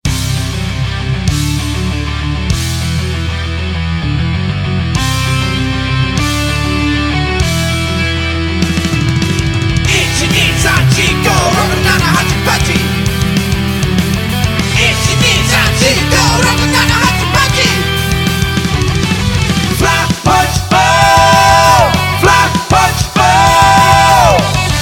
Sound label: With Vocals; Fly Punch Boom